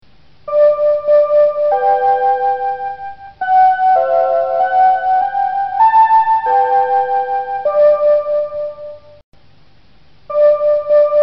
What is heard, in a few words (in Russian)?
pozyvnye sssr radio